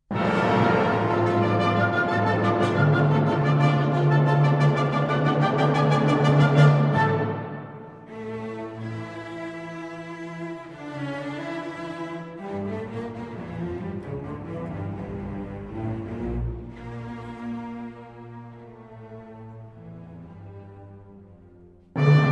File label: Symphony in D Minor, Choral, Presto